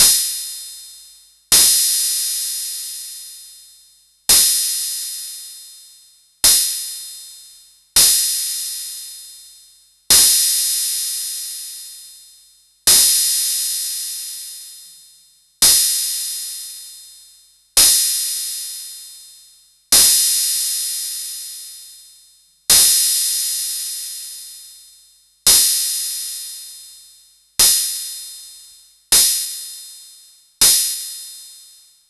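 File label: cymbal, saturated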